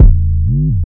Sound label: Slide 808